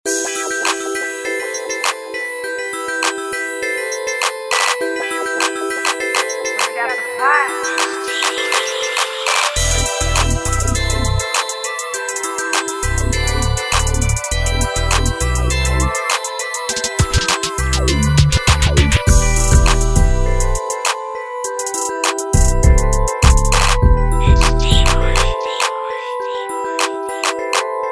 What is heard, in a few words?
beat